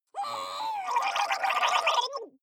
water monster female gargle upset long, comedy cartoon; soundpool